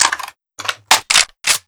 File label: assault rifle world reload; tf2